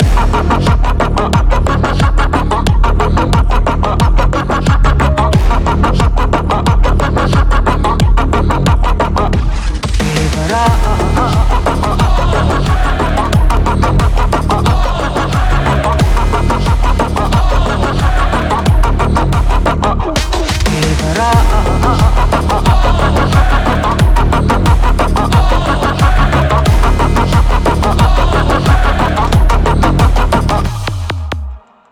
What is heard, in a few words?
Ringtone Mix